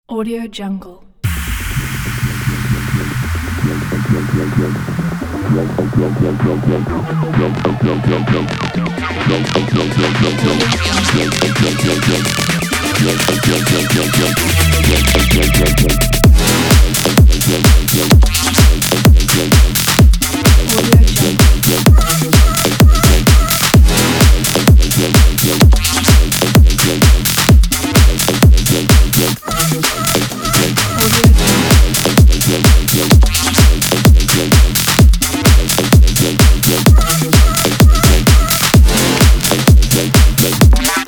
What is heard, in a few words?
this is edm